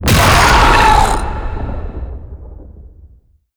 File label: vox revived screech, zombie